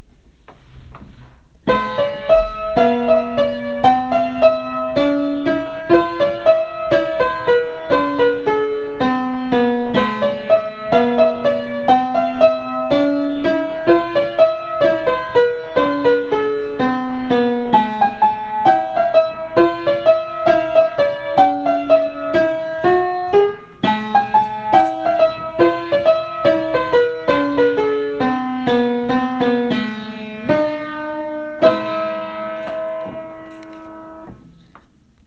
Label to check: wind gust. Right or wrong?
Wrong